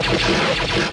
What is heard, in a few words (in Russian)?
ataka s lazernim oruzhiem